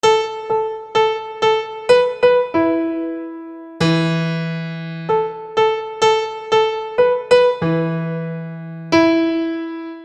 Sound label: Keyboard